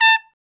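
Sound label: BRA TRUMP, trumpet, brass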